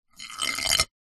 industrial ballbearing metal roll inside metal tube